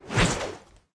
Spin; smite; horus

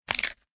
idle knuckle crack; smite; kuzenbo

